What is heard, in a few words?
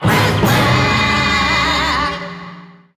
Goal Fanfare; crash bandicoot series sound box; Track and the Beanstalk; 4th8th Place